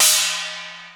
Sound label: KOREAN CYMB; Crypton - DrumBase5000